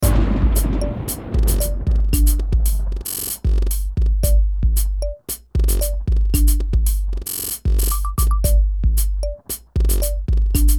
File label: pluja lluvia rain